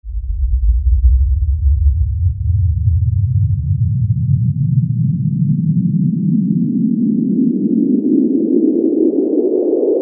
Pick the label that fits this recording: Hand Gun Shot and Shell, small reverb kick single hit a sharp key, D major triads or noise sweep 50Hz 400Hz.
noise sweep 50Hz 400Hz